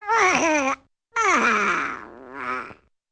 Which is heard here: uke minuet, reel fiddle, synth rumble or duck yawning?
duck yawning